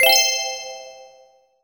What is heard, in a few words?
purchase ding